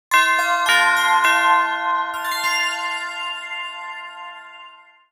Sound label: Bell Short; Kirby's Extra Epic Yarn